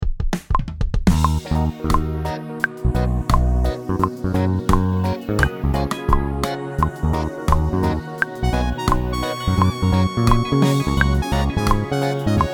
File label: Reggae Sample; L4C STUDIOS